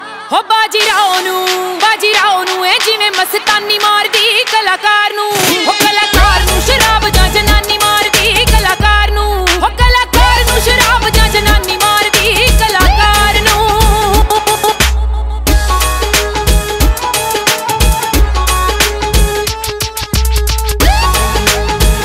Punjabi Song Ringtone

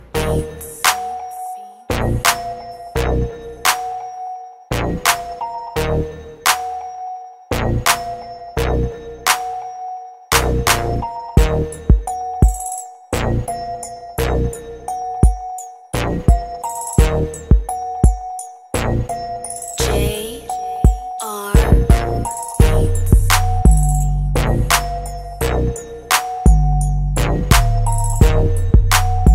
English Instrumental Music

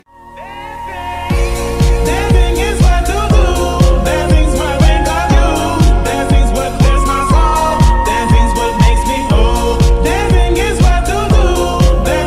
English Ringtone

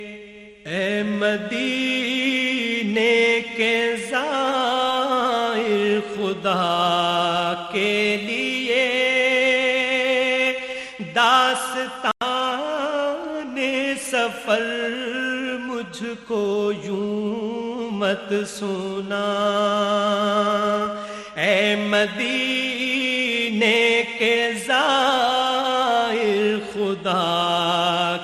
Islamic Ringtone

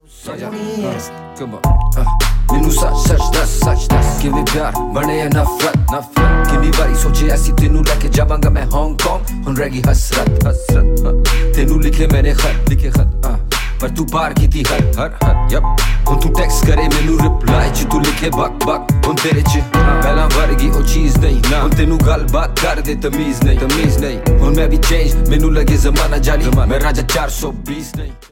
rap

